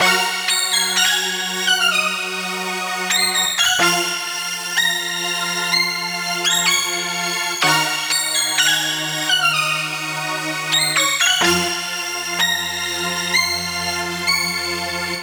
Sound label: Chord 126 A Min, future pop; Nexus